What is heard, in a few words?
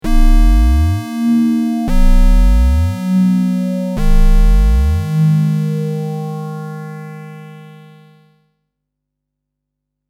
filter delay squ saw